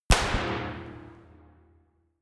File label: gun slapping pop gun ii; schusswaffen